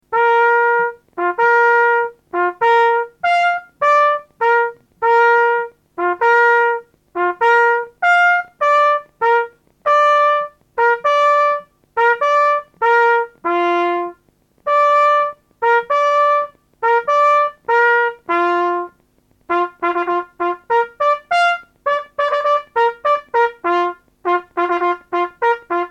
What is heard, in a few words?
fanfare